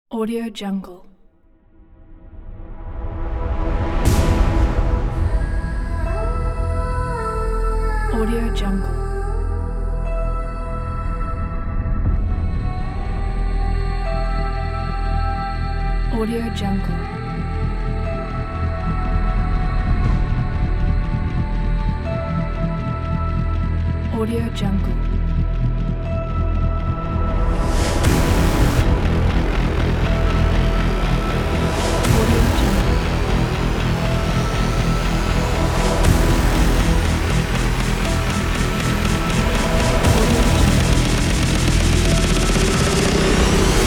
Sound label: aggressive trailer intro